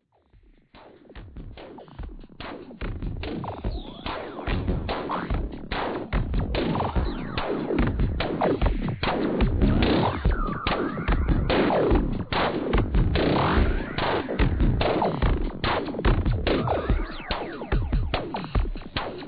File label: clock works; electro